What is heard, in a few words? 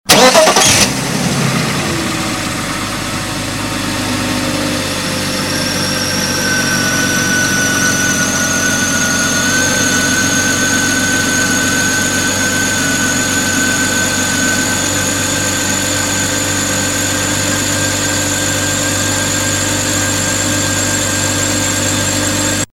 M54 whine sound, 525i; 2000 the e39 525i